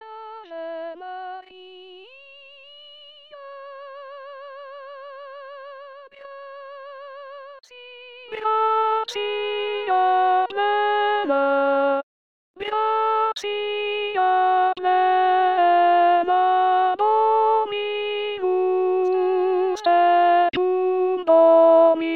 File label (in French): avemaria alto; victoria; 2016 vox maria